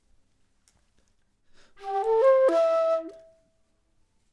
flute

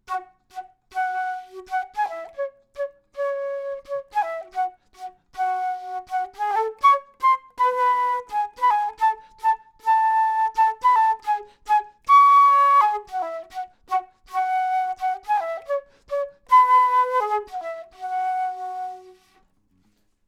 flute intro; logic

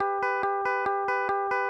Amp; logic

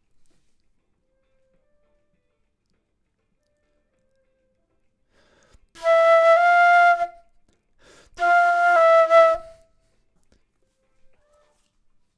flute; logic

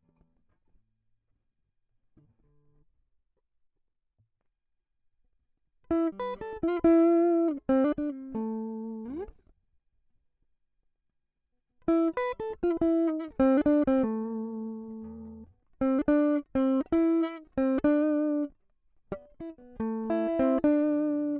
Small Bright Combo

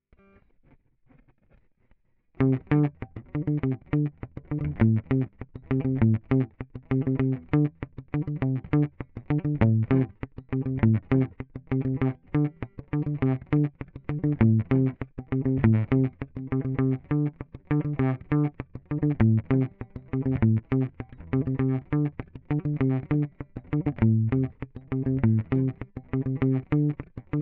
Small Bright Combo; logic

